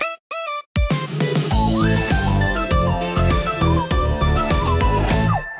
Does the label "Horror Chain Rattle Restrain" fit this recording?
No